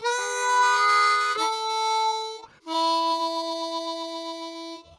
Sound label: harp; Verdigris Music